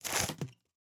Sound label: Windows Recycle; GMM Windows 10